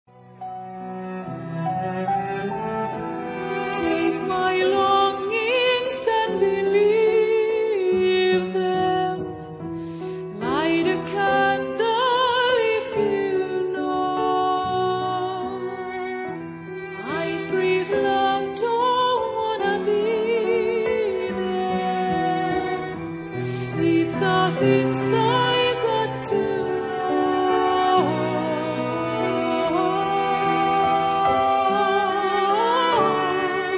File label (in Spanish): ringtone